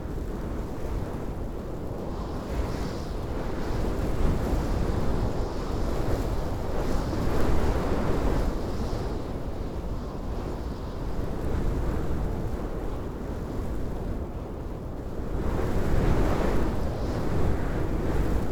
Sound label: Wind strong; urban and suburban; boom library